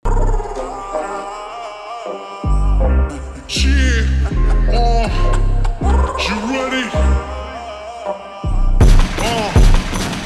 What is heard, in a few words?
Ringtone slowed and reverb